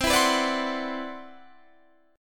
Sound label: C Major Sharp 11th, Guitar Standard; strummed; capo 9